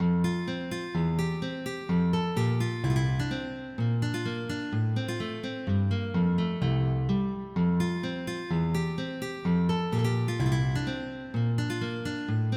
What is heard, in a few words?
Paysanne, MIDI